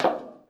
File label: Char Fs Sprint Metal; We Happy Few